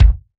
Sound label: Bass Drum Set; Roland BK-5